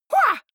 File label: goblin fight shout attack short, comedy cartoon vocals; soundpool